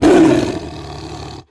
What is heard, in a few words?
roar